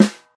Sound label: short acoustic snare sound G sharp key; snare drum samples